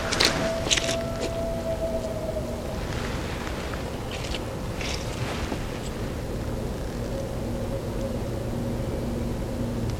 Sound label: Howl